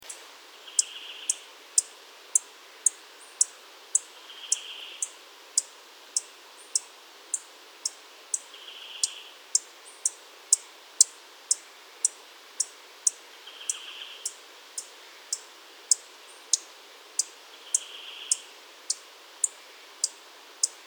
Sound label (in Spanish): comesebo patagónico, Phrygilius patagonicus, Patagonian Sierra-finch; cantos